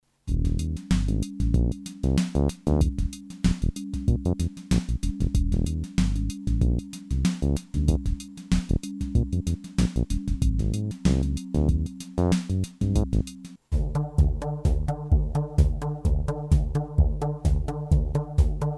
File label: Nord Micro Modular; Antilope Zen Tour